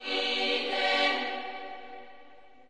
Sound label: Slavic Choir F sharp Hee Neh, Slavic Maybe Bulgarian Choir